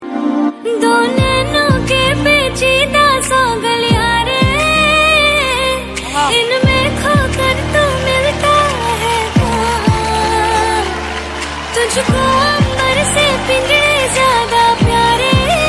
Female Version

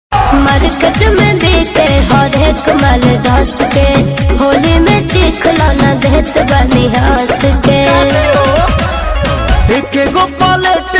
Holi Song

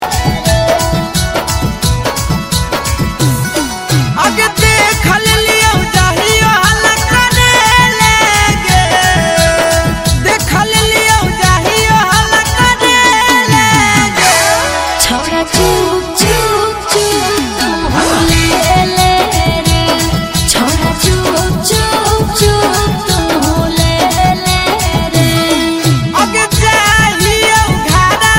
maghi song

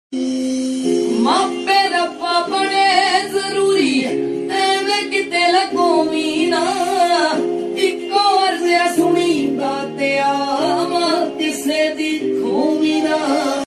Punjabi Song

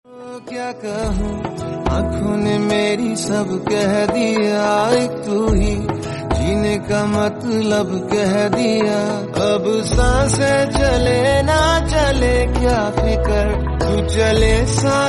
Hindi Song

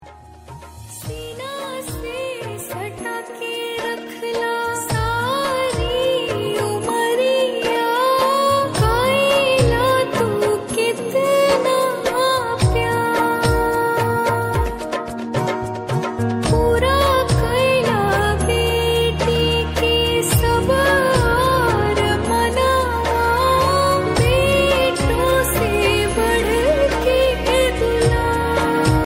female version

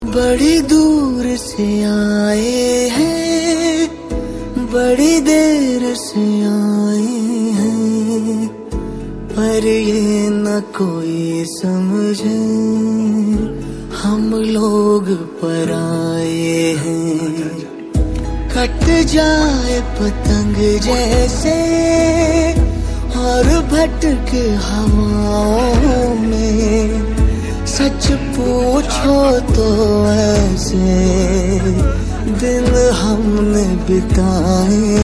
Song

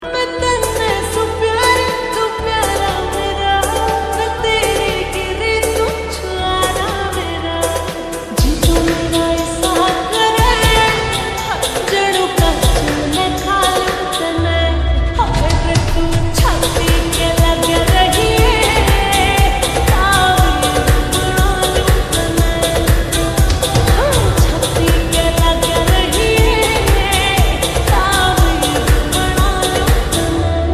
Female Version

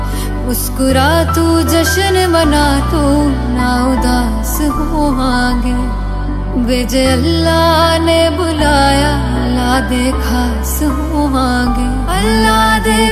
Female Version

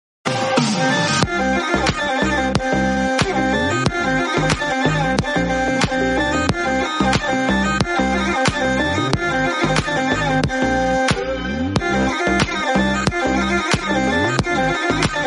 Background Music

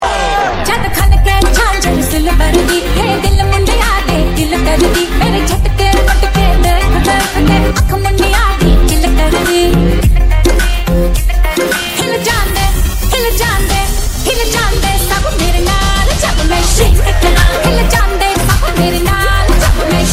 sad new Punjabi song

